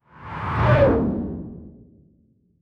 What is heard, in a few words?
a digital and tactile, digital